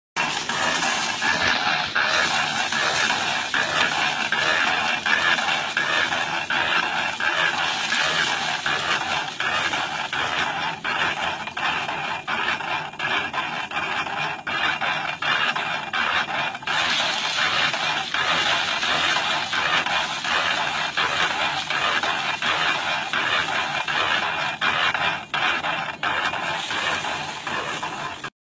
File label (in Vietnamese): tieng dap may tuot lua dap thoc ngay xua